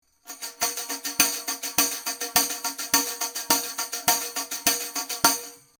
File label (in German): Warm Audio WA-67 Tambourin Figure8 Front